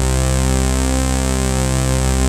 SYNTHS; Pro-Rec - Synthsonic

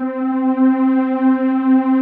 TERRA PADS; TR PADS; Pro-Rec - Synthsonic

